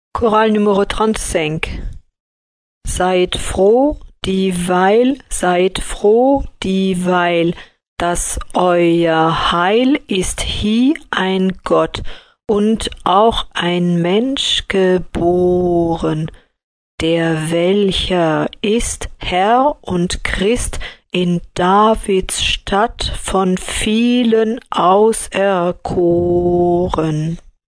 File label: choral; partitions chorales